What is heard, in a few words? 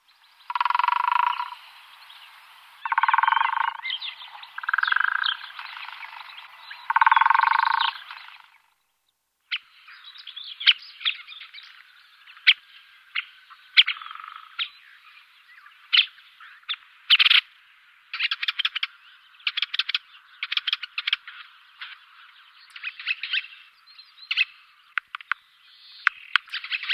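siriyskiy dyatel voice, Syrian woodpecker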